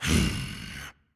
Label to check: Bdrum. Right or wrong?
Wrong